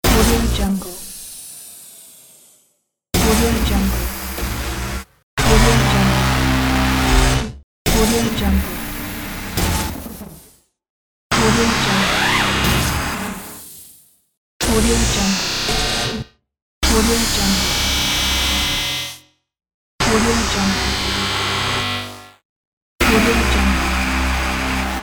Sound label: electric blast